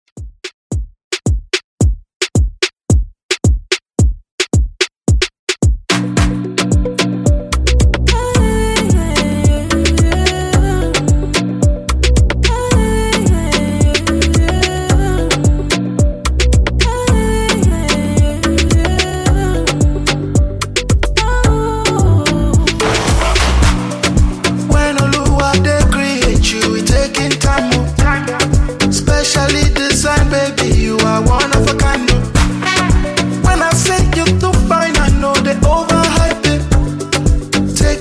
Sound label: Afrobeat 110 Bpm